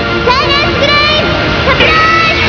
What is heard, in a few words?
silence